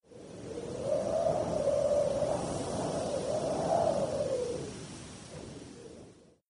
wind sound; gamerip; Arc of Templar

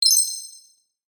Ui Confirmation Alert; switch 2022; monster destroyer; Inspector

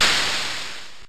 Steam Eruption

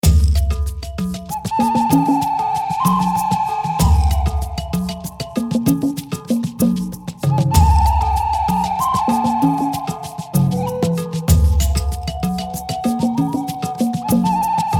Prehistoric Music; There Is No Game: Wrong Dimension

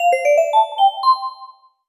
Jingle Chance

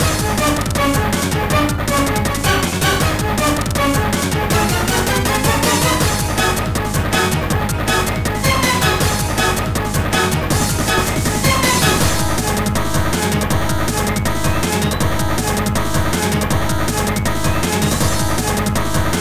Intense battle; puchicon; dsi ds

